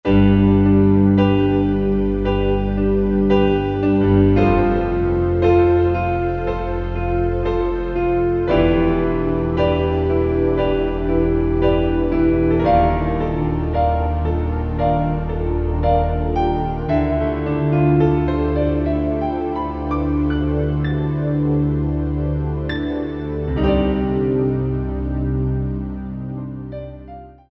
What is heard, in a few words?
E Auditorium, Korg TR-Rack; EAuditorium+MIDI